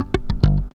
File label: REAL BASS, BASSLICKS; Ueberschall; Jam Box